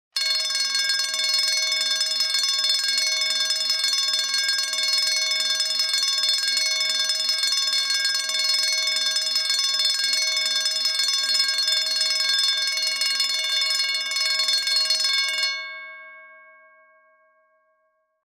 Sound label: Fire alarm bell emergency steady ring long